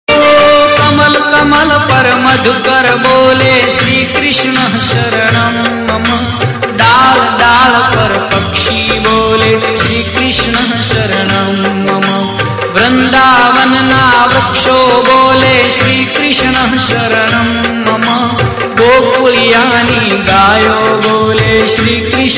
shri krishna bhajan

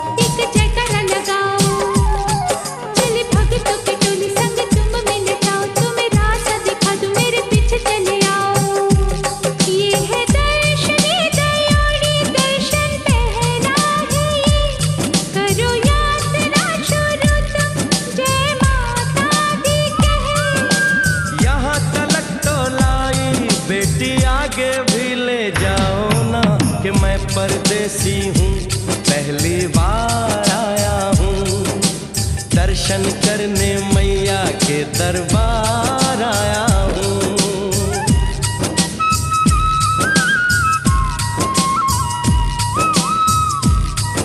Vaishno Devi Bhajan

